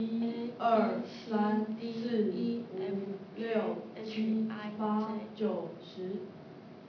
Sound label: real mixture sound; Intelligent numerical Analysis